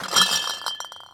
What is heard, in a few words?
Glass Lift Beer Case; Foley - Rattles